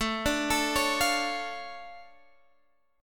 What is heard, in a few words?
D Minor Major 7th, A, guitar